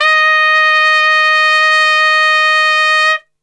D#3, Brass Super Section; Best Service

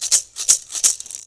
MULTI RATTLE; Best Service; World Colours

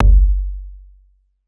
kick verb; kicks

